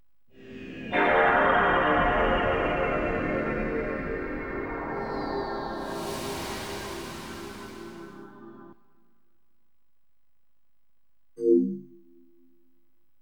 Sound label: Sony PS2 Boot